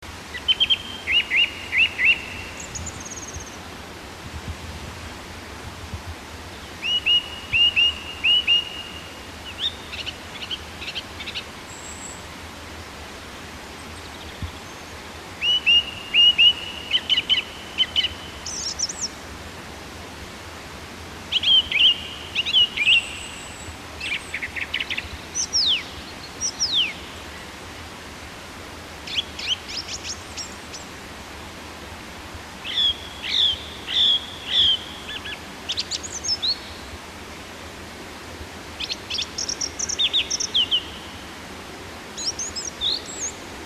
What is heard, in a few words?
turdus philomelos, vogel; hecke; biologie